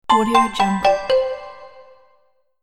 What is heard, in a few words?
xylophone and mallet notification